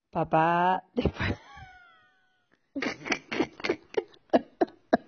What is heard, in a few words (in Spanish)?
tu risa